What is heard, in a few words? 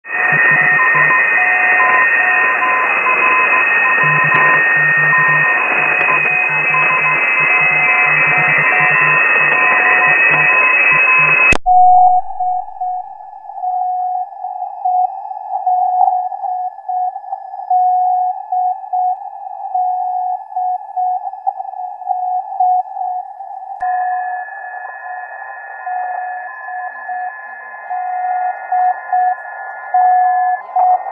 cw filter